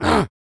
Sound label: Stoner Attack Vocals